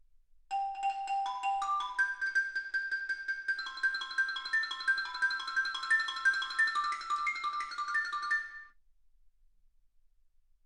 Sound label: xylophone